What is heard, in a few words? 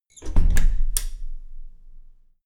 closing door